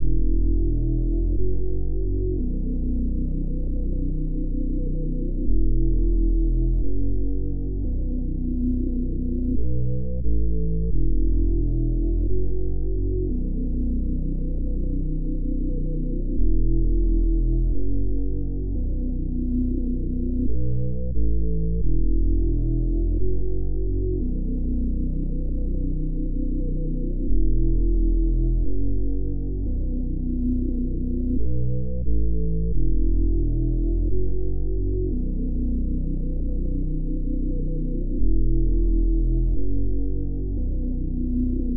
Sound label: 88 BPM B Min Bass; Melody; Loop Stems; Cymatics; 2022 Melody Collection Platinum Expansion - Pt 1; Flashbang